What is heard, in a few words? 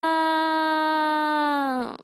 ahhh; Zero two